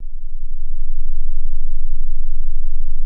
sub, bass analog & synth; MASTODONTE; USB Soundscan vol.12; AKAI

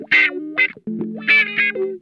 MUNCH WAH, Funk Guitar; Zero G - Funk Guitar

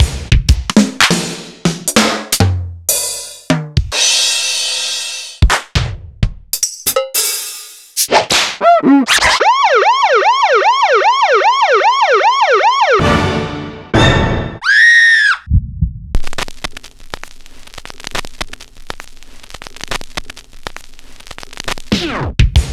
Korg M1

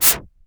cinematic impact wipe

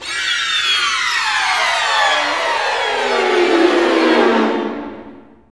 STR SEC, STRING GLISS; Roland - LA Composer vol. 1

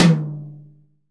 TOM, CTD TOM B

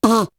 Beatbox; ZERO G - Datafile DISC 3

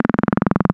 VV 80 Res Saw, wavetables; Animoog

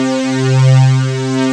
SYN WIDE, Wavestation; Sound & Vision - Gigapack I CD 2

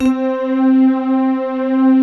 VIBRA STRNGS, pads; Pro-Rec - Synthsonic

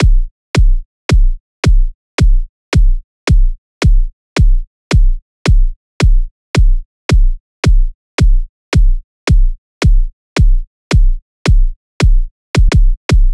loop kick 116; fides

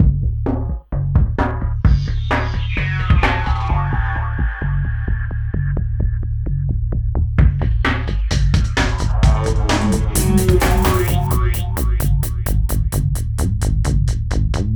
Hardcore Breakbeat, 130 Abmin; Big Fish Audio; Hardcore Breakbeat Action; Mirage

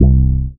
DM BAS, Dance Mega Synth

